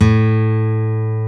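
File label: ACOUSTIC G, acoustic guitar; E-MU Producer Series Vol. 2; More Studio Essentials; Composer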